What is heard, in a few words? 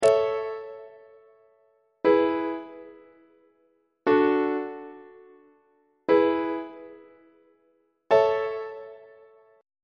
a major perfect authentic cadence on treble clef